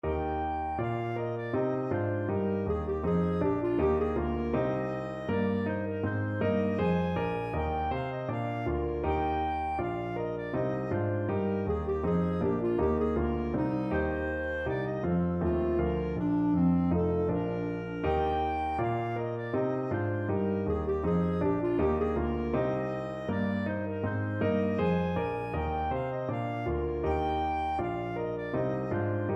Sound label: hornpipe, clarinet